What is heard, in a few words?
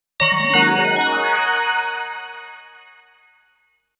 Chime!; puyo puyo fever 1 2 soundtrack